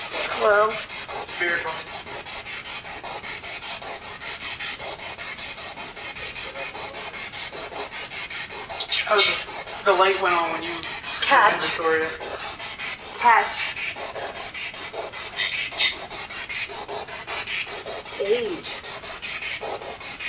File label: Balcony Spirit Box; twin city opera house investigation